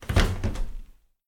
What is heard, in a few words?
House Door Open